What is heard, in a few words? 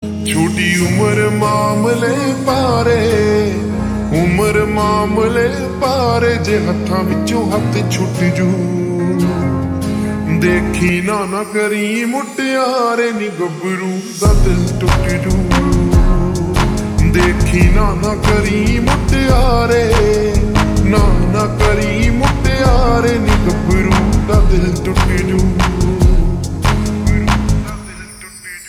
Slow Reverb Version Ringtone